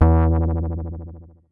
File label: Sci fi